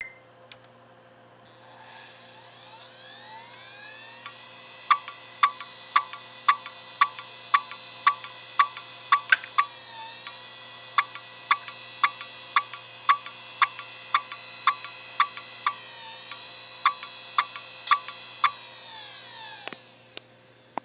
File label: clicking